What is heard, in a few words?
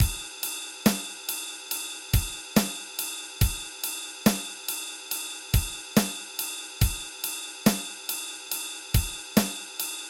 drums